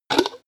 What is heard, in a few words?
jar empty lid open; gastronomie; berufe